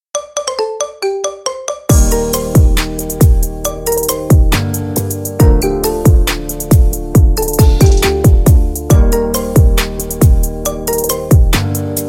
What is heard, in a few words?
marimba remix